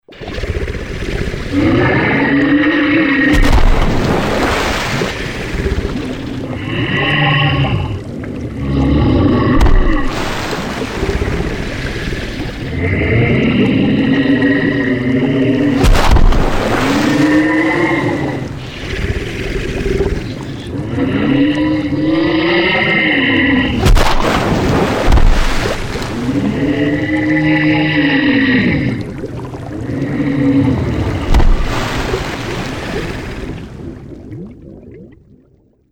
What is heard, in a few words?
water monster